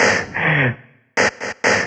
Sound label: House Loops, Vocal Loops House 128BPM